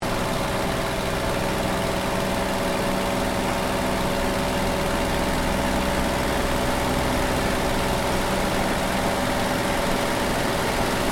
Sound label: AIRCRAFT P-40 KITTYHAWK FLYING INTERIOR STEREO; P-40 Kittyhawk / Warhawk / Tomahawk; Airplanes (Sound Effects:Vehicles)